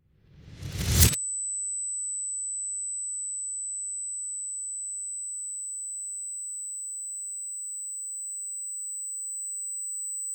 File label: Whoosh And Vacuum